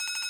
count up loop, game; piggy riches

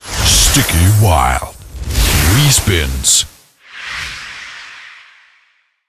respins intro; joker pro

